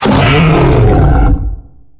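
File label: krayt dragon hit; Star Wars Knights Of The Old Republic I; XBOX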